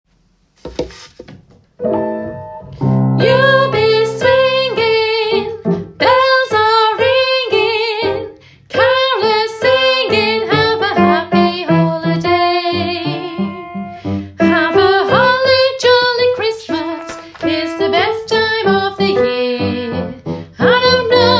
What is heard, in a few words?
alto